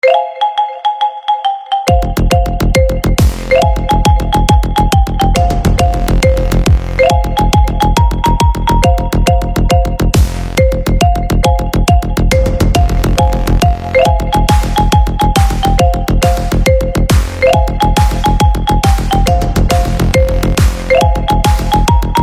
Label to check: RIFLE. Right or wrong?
Wrong